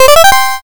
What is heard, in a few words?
levelup